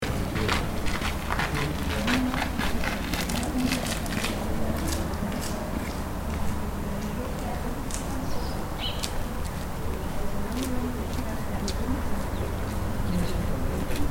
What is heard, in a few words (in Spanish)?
Ambiente Visitantes Cementerio